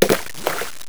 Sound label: big splash; Castle of Xhentars